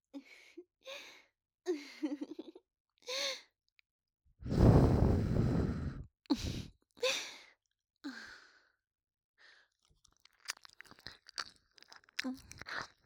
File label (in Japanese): キス; 【マゾハーレム】